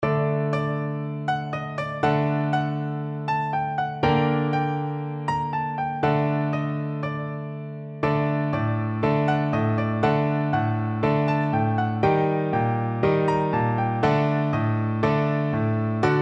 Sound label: hava nagila, piano duet